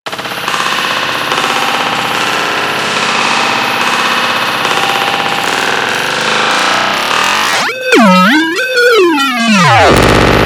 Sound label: Delay tweak test